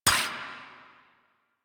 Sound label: Pistol Mech; Operators; Drifter